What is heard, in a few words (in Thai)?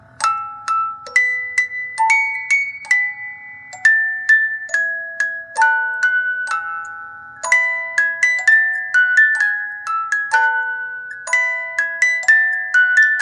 กล่องดนตรีเพลง Twinkle Twinkle Little Star